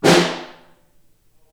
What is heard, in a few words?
SN Far, Snare